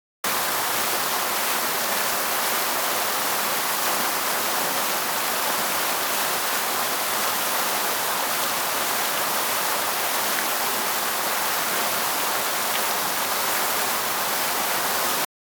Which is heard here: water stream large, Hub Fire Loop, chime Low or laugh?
water stream large